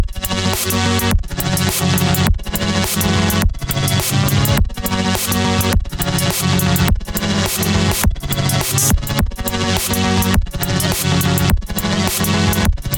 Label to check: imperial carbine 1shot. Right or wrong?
Wrong